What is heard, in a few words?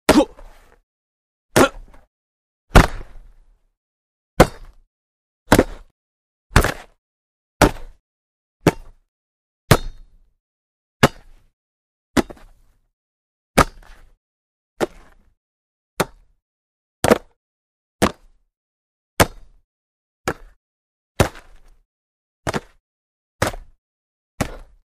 Football Catch Grunt, football